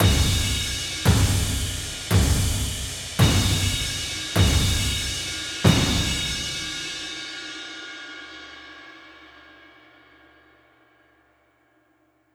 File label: Loop End